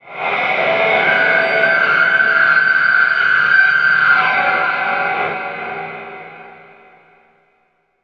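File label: feedback squall, Alien Guitars; Big Fish Audio; PLASMATIC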